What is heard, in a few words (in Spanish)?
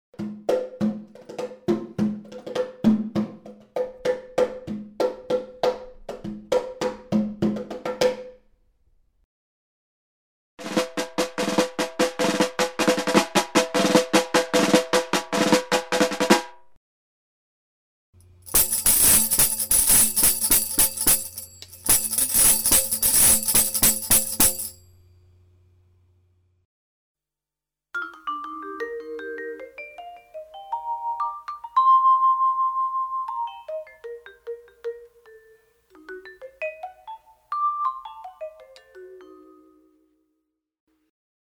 instrumentos de percusion